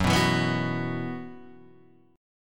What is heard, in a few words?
F sharp 7th Suspended 4th, Guitar, DADGAD